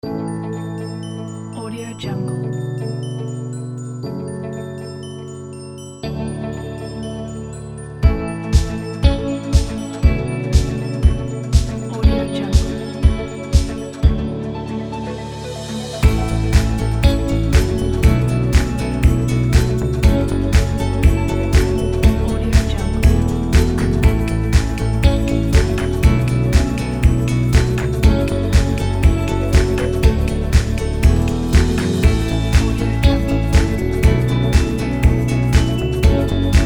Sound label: motivational shiny background